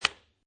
Billiard Pool Snooker Place Triangle Frame On Table; Sports